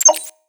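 UI, HUD, Short, Terminal, Beep, Lofi, Retro, Scifi, Menu, Action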